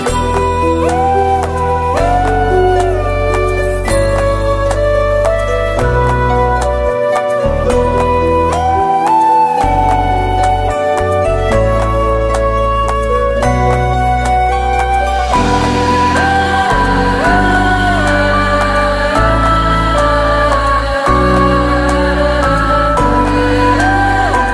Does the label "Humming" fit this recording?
Yes